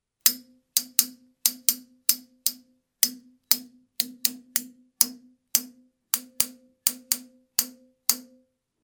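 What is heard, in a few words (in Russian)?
стук по батарее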